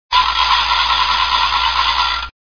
Clacker; NewYears

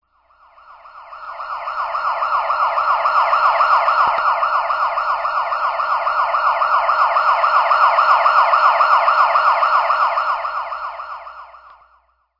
Cop Siren, vehicles

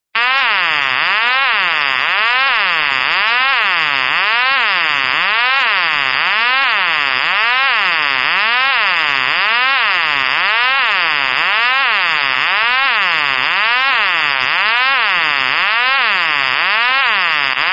Western Electric No Such Number Tone, Bell System

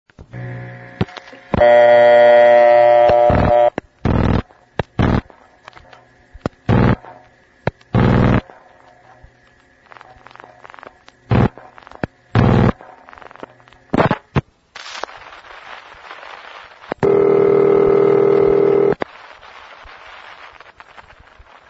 Crossbar Dialing Local, Bell System

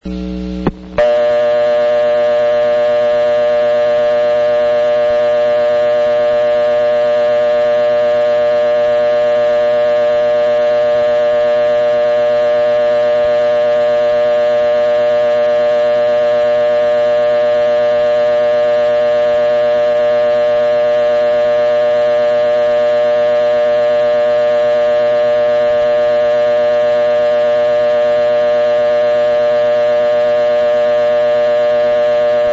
dial tone, Bell System

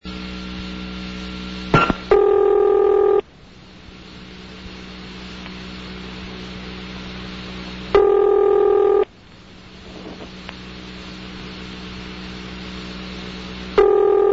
ringback, Bell System

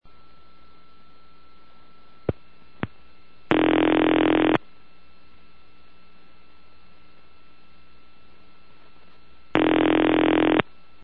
ring, Bell System

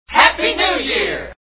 Group Cheer, Happy New Year

